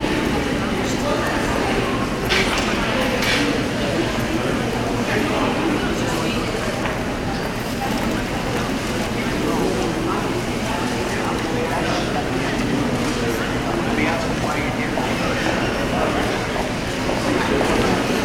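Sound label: ambience urban city nyc wall street public space internal; wall st pub spce; sound effects one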